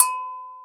Gankokwe Hi Op; Multisamples; Digital Sound Factory Planet Earth

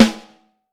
Vintage Snare Drum

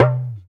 Talk Drums; Spectrasonics; Supreme Beats; African Contemporary

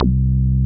MOOG MID C2, ANALOG BASS; Music Store - Claudius Diemeter Schwerlos

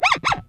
SCRATCH, Bass; EFX SCRATCHS

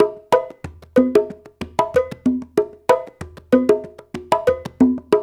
93 Triangle; Tri.Again

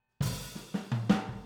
Boogaloo - Overhead ST, 82 BPM; Drums; Intro; Sonic Foundry; Drums from the Big Room The Mixes

